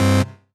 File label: Synths Root C